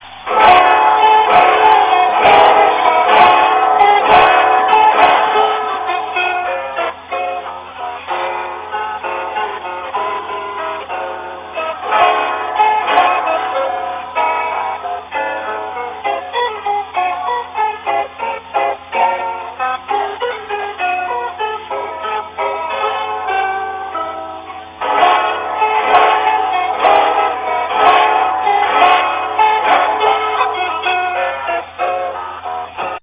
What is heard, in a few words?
waltz, guitar